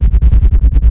loop kpvt bass, guns tanks

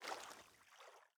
footstep water med; Rust

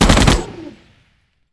Machine Gatlin Fire; Civ4 beyond sword

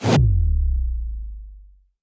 damage hits explosions, Warships

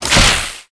aerobatics smoke start; War Thunder

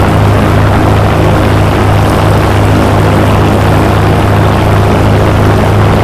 bearcat rear, engines; War Thunder

